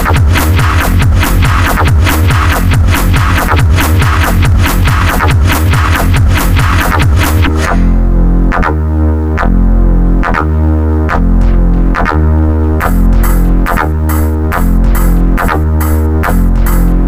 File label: beats